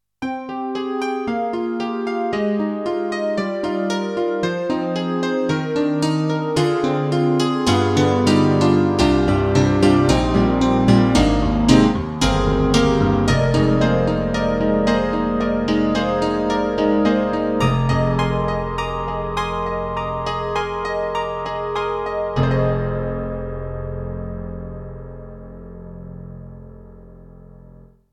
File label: Power Grand; KORG N1R